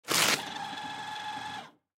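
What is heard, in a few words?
Paper Towel Dispenser; Industry; Blastwave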